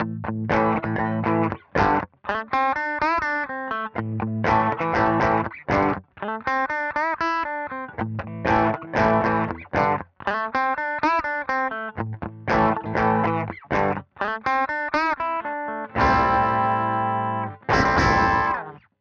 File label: Eclipse Original Rear